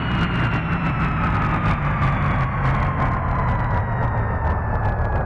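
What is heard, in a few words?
engine decel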